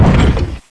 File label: step; vendetta; robocop